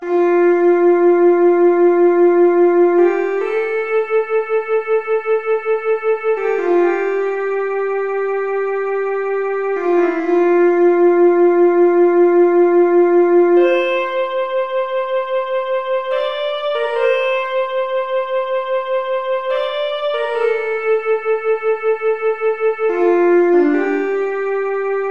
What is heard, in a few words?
hum; deux voix du chant commun (les paroles c’est « mmmm mmmm mmmmum »; d’abord la voix des femmes